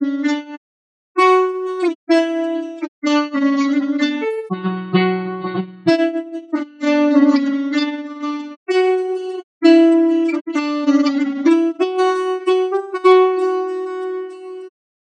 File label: Seed solo